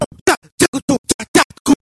beat terrivel pikotado; Base De Funk

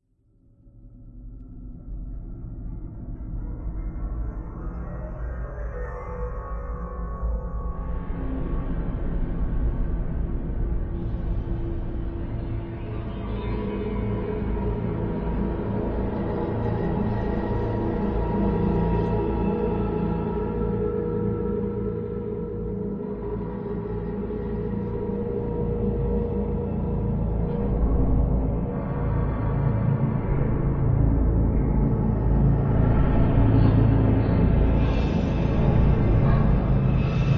fundo musical suspense terror horror themes musicas de terror soundtrack

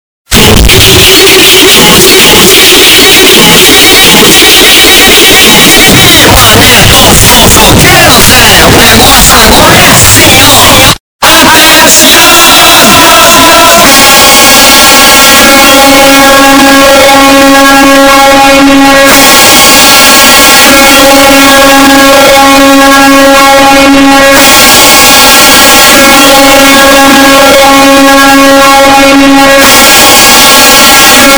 Corneta automotiva, Funk graves

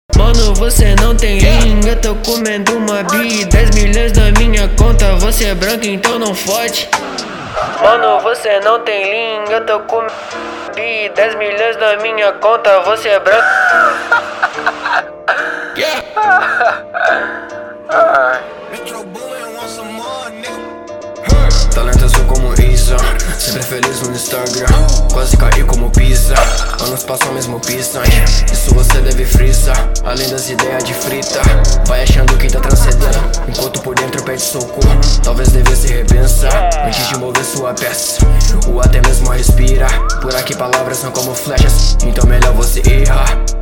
trap

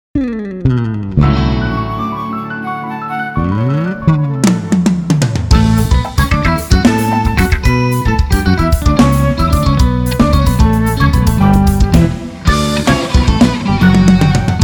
instrumental

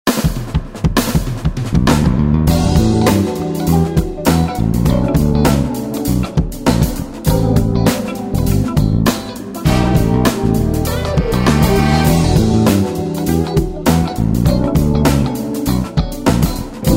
funk

